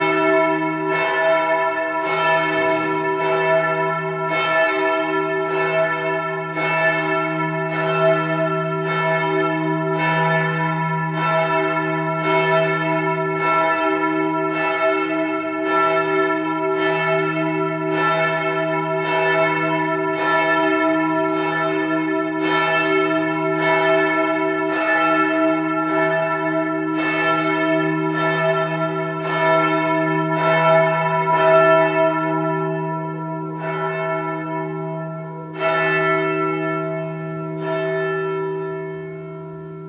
Cathedral, Bells isolated at St Stephens Cathedral Vienna Austria, 3D binaural